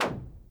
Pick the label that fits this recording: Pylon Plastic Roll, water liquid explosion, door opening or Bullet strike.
Bullet strike